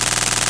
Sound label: plane; svencoop; breakfree3